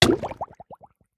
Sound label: drown, player hurt; entity, player; minecraft